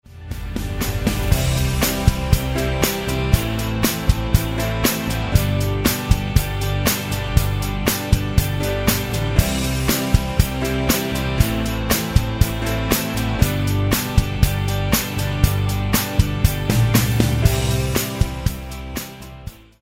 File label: Country